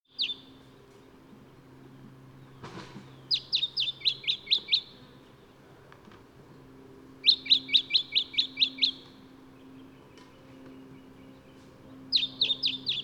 Microeca Flavigaster; Lemon-Bellied Flycatcher; Call

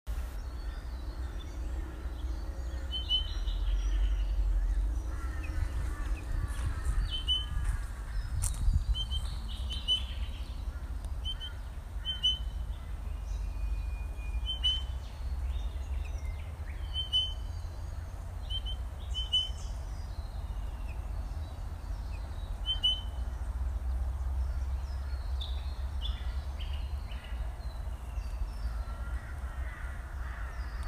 Pardalotus Punctatus; Spotted Pardalote; Family - Pardalotidae (Pardalotes); Call

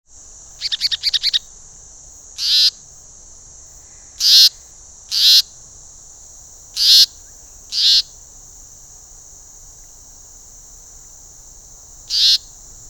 Myiagra Rubecula; Leaden Flycatcher

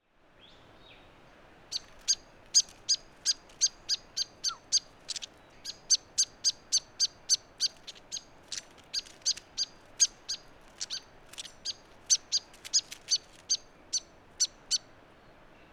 Myiagra Cyanoleuca; Satin Flycatcher

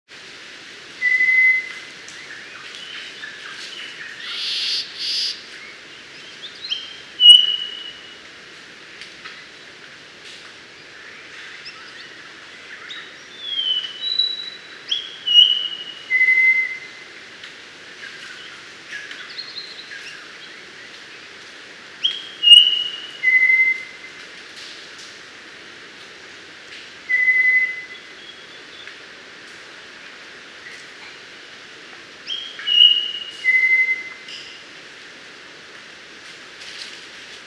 Melanodryas Pulverulenta; Melanodryas pulverulenta leucura - Eastern Mangrove Robin; Call

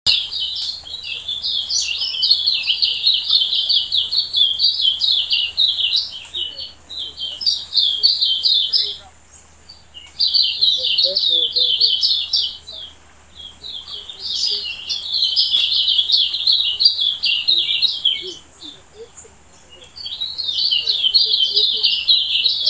Zosterops Lateralis Vegetus; Silvereye; Family - Zosteropidae (White-Eyes); Call; Call 2

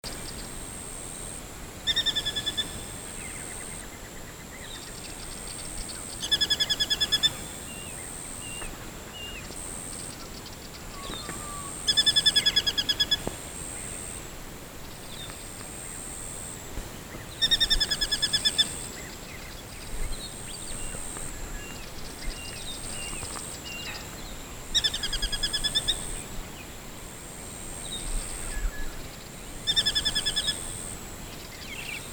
Tachyspiza Cirrocephala; Collared Sparrowhawk; Call

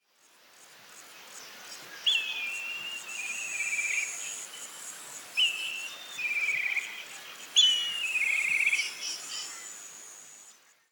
Milvus Migrans; Black Kite; Call

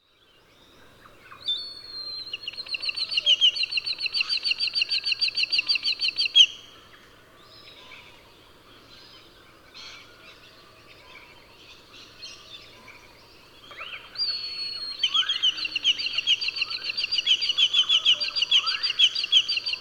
Haliastur Sphenurus; Whistling Kite; Call

